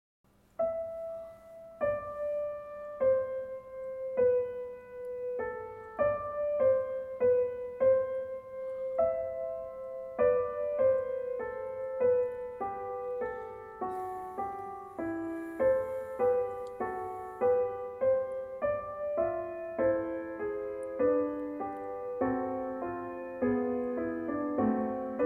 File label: Fughetta on the Danish Amen, piano